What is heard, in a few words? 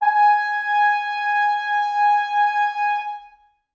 brass acoustic, muted trumpet